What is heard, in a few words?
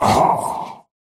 pain big; Slork